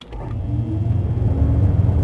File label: elevator start; Max Payne